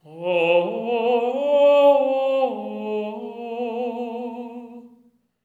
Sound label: LYRICAL; Spectrasonics; Symphony of Voices